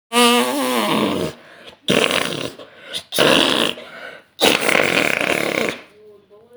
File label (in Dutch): dikke fart